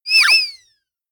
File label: notification; samsung Aleart